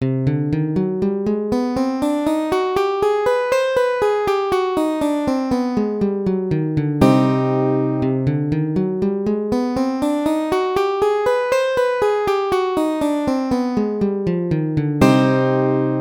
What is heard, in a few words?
cm hungarian minor scale